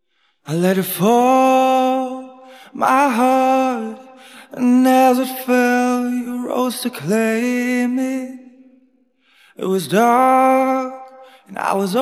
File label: cover, vocals